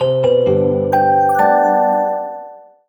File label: gong